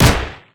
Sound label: machinegun; weapons; ufoai